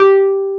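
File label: Wurlitzer G2, Keyboards Old School; USB Soundscan vol.09 - Keyboards Old School; AKAI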